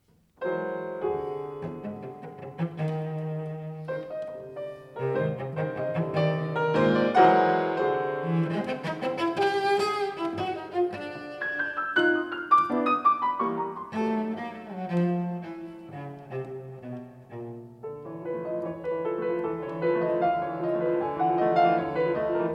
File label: Sonata for violoncello and piano